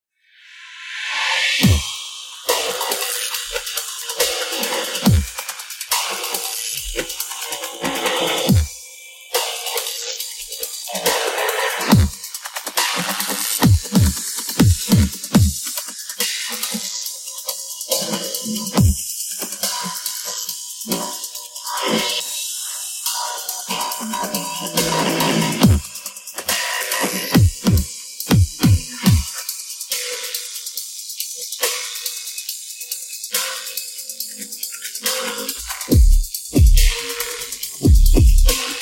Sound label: drums, Stem